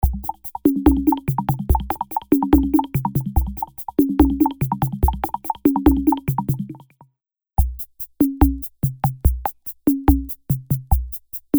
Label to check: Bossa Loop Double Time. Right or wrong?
Right